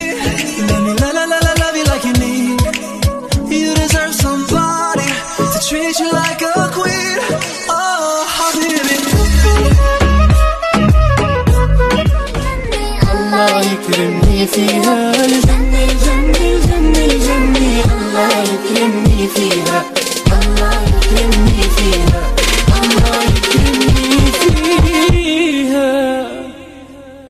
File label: arabic ringtone; airtel